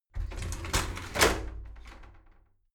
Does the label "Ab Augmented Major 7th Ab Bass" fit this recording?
No